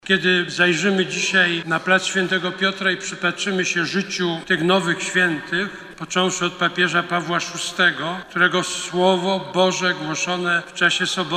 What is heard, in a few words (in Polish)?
chor